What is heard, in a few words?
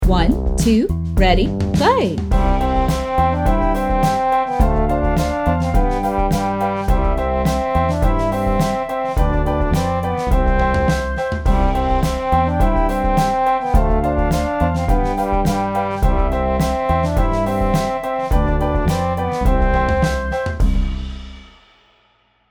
WITH INTRO, ukulele; Rainbow Ukulele